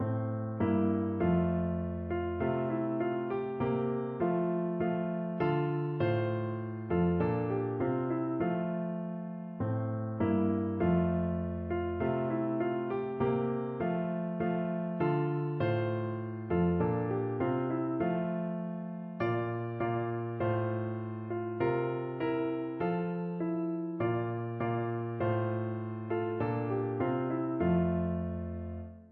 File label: once in royal, piano